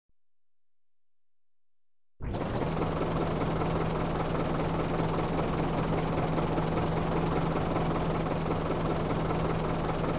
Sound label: marder idle